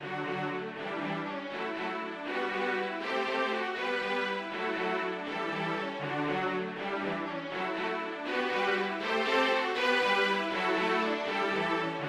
tchaik doll, string quintet

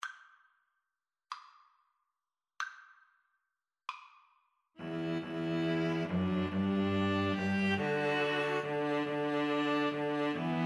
what child, string trio

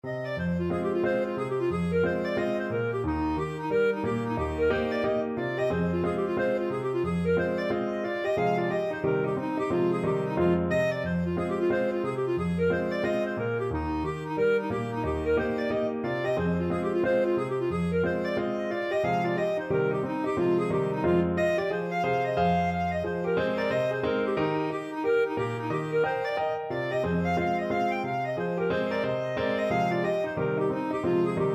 clarinet; swallowtail

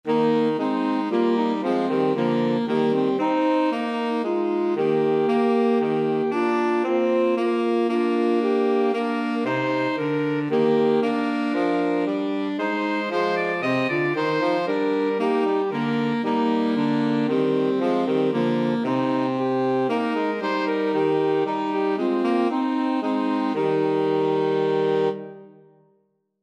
sax, 2 altos tenor sax; 3 SAX

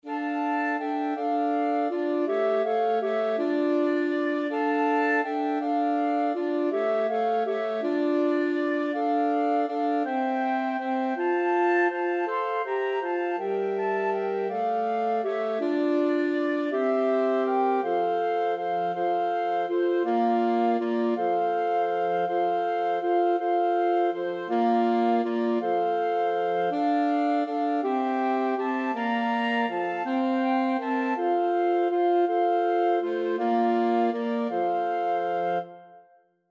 flexible mixed ensemble, 4 players

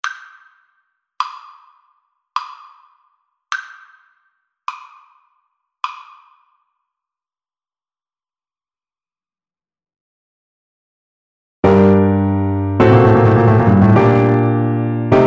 sonata, Cello; marcello

